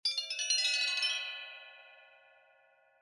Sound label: tone